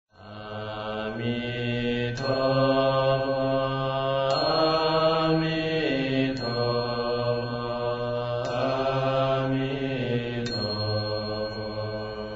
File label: man